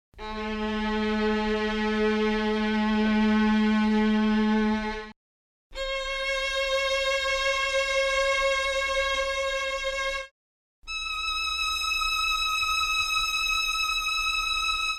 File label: C3 violin, mellotron